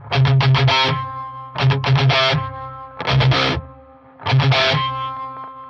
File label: four power guitar crunches; GUITARS